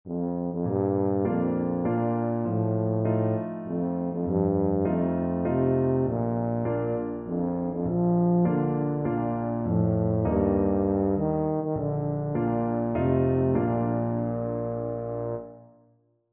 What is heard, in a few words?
happy birthday, tuba